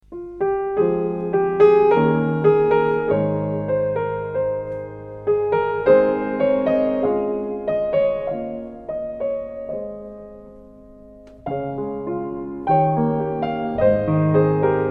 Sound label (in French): instr.